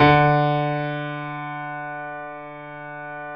D 2, Keyboards Acoustic, GRANDP ST; USB Soundscan vol.17

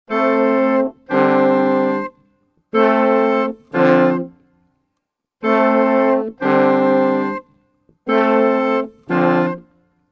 90 a min ww+brasses, Orchestral arrangements

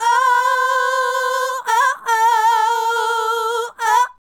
Female Group, Hip-Hop and RnB Vocals

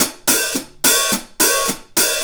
Hi Hat Pattern

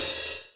HH Open, EMU Proteus-2000

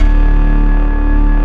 ORGAN BASS; Techno Trance

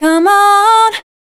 FEMALE, Dance Vocals

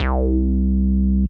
Synth Prophet Bass C; Electronisounds

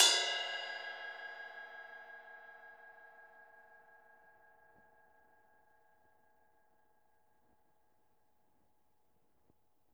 ride, Drums Percussion

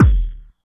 Drums; Distored Reality

